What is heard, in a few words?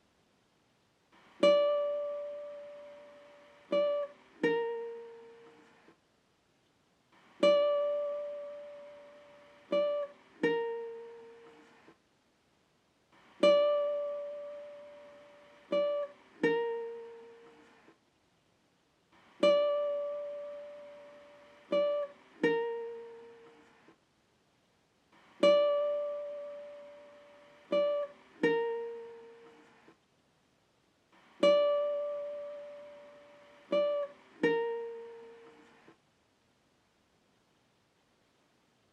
80 BPM G Min Guitar; Loop Stems; Cymatics; Harmony